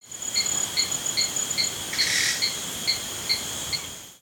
Binocular Path Jungle, ambience gnarled forest

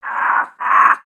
Pets Skunk Angry; creatures pets; Lego

